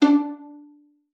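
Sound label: violin pizzicato D4; Xsample VOL-5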